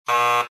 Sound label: boing